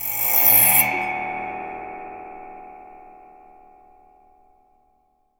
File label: SPRING, Definitive Percussion; RECO